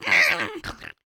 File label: goblin scream